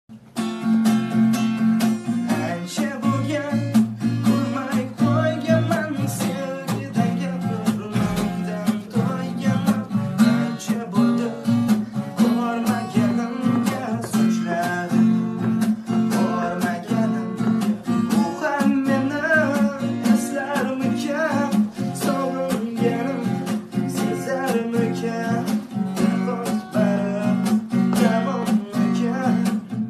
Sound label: gitara